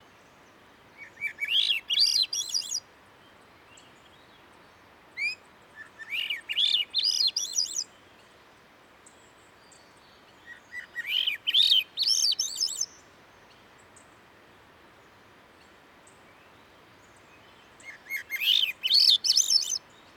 Swainsons Thrush Catharus ustulatus; thrushes thrashers

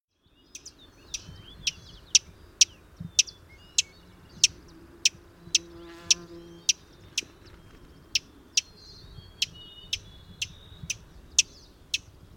Fox Sparrow Passerella iliaca; sparrows

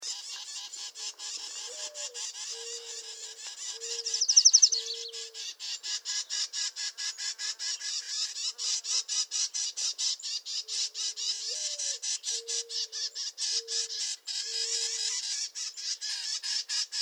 Bell's Vireo, Vireo bellii; vireos

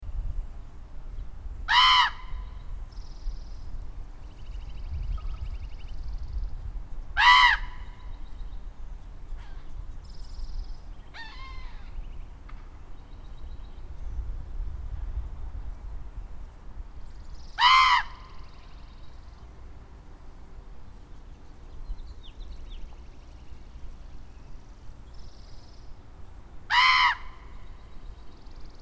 Common Raven, Corvus corax; jays and crows

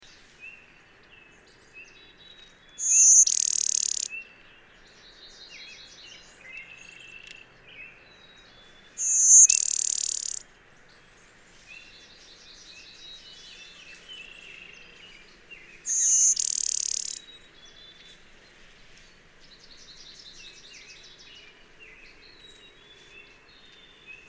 Blue-winged Warbler Vermivora cyanoptera; warblers

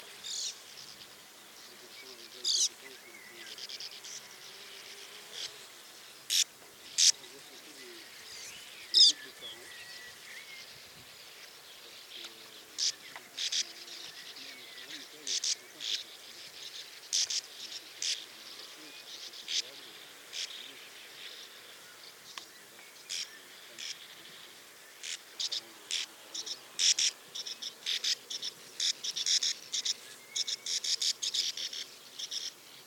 Bank Swallow, Riparia riparia; swallows swifts

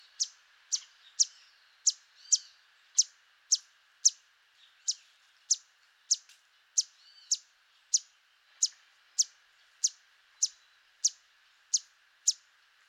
Yellow Warbler Setophaga petechia

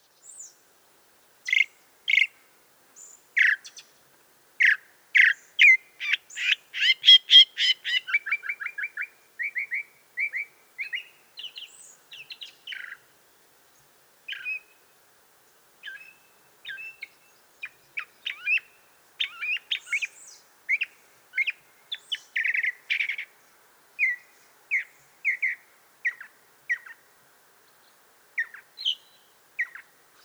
Northern Mockingbird Mimus polyglottos; thrushes thrashers

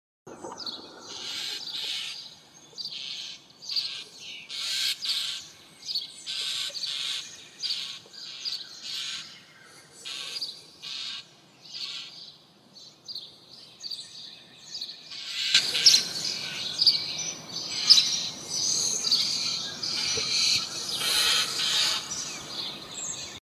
European Starling, Sturnus vulgaris; blackbirds orioles

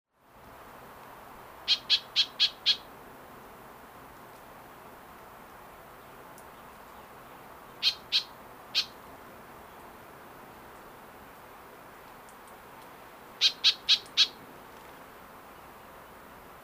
White-eyed Vireo, Vireo griseus; vireos

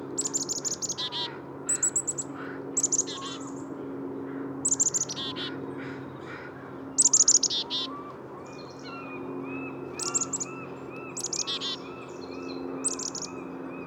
Black-capped Chickadee, Poecile atricapillus; chickadee nuthatch titmice

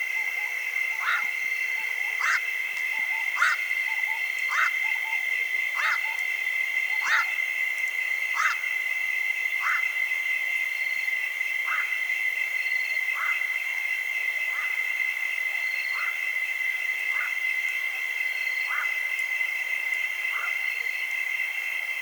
Black-crowned Night-heron, Nycticorax nycticorax; wading largewater

